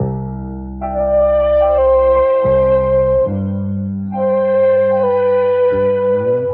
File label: piano and horns, Sad Loops